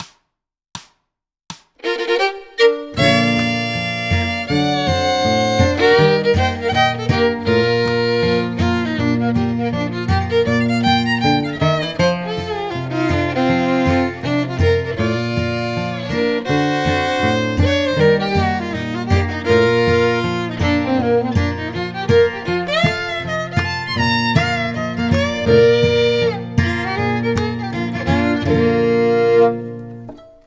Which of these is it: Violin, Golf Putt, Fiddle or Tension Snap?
Fiddle